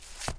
foley foot scuff; Earth and Beyond; EA Games

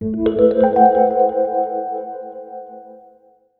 rhodes flourish; makingwaves

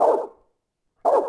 bark; DAoC

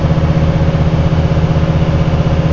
MOTOR, Slot Car

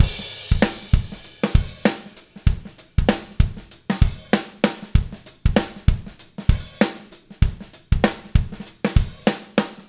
alt beat; maudio; RB Disc

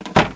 lever

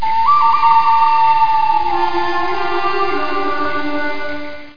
kickhead; Games World; New Game Levels; Duke Nukem; filmwarp2

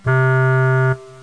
WOOD

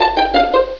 Stairway; Grand Central Pro

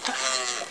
Minimize

